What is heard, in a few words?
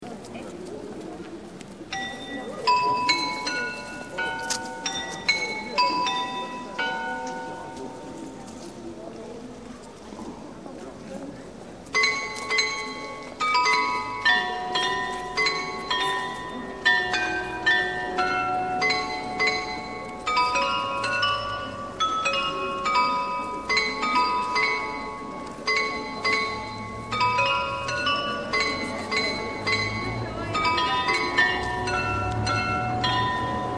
meissen sound mono